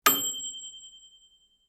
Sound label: microwave oven bell, household sounds